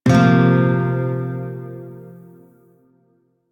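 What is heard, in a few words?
Shawzin Pentatonic Minor Chord A, Music; DE; Warframe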